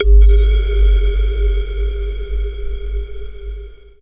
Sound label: screechy bass; Jungle